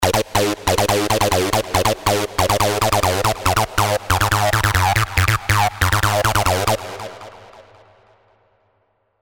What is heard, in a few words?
Acid Interlude, hard techno; revealed sylenth1 hard techno vol 1